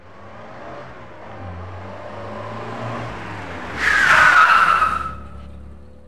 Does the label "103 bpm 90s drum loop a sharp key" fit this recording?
No